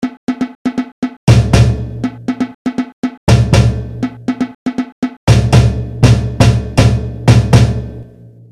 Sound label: samba reggae intro break